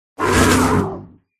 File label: forest keeper attack voice up